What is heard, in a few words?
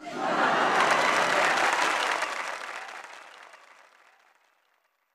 Laugh; Heaven Studio Plus; manzai